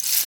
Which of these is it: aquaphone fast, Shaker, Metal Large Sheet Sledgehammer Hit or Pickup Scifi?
Shaker